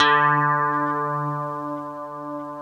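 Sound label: STRAT D3, clean guitar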